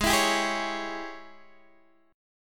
Ab Major 7th Suspended 4th Sharp 5th, Guitar, DADGAD; x,11,14,12,11,11; down